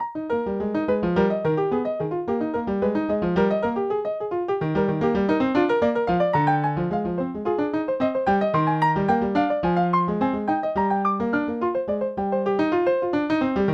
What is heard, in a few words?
praeambulum en mi bemol majeur